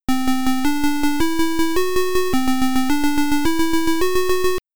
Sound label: Music Test; Star Wars (Arcade Version); Music Test 2